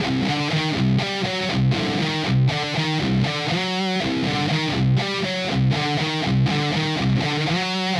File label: loop Eb 120bpm